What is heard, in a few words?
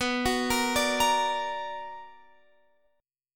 B Minor Major 7th